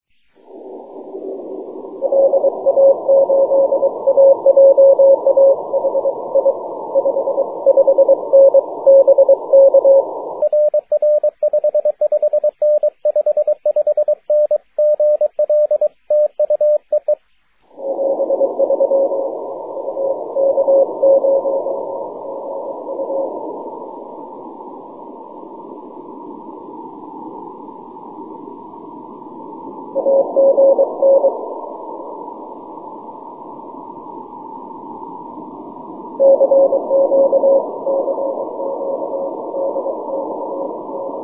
6m cw

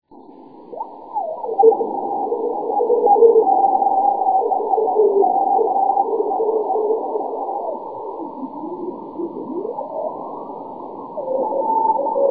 beacon

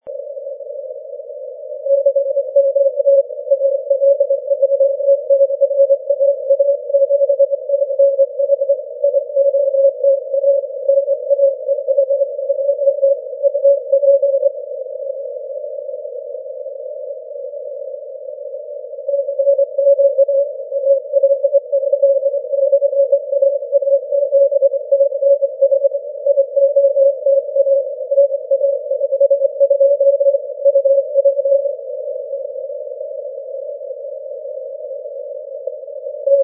20m cw

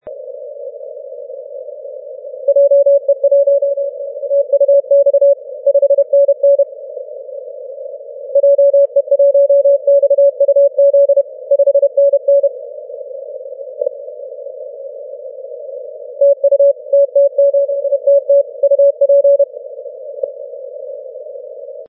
10m cw

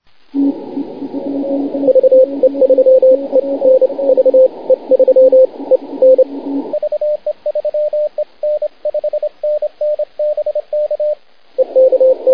6m cw

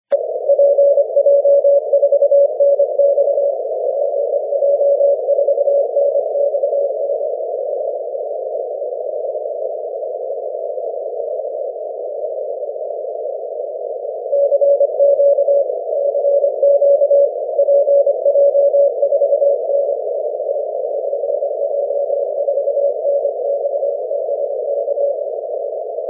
6m cw

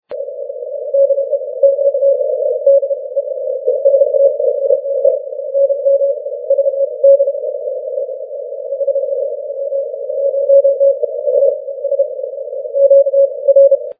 30m cw; 4w0vb

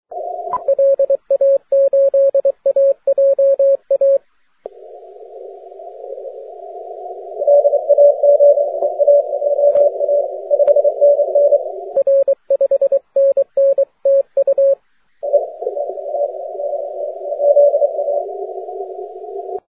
30m cw

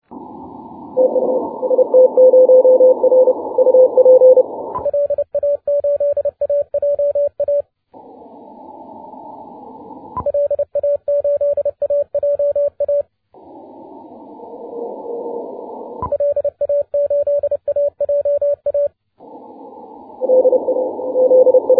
6m cw

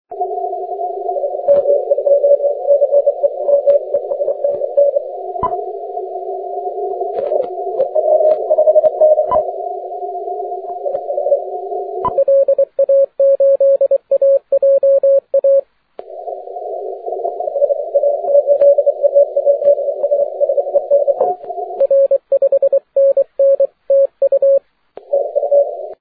30m

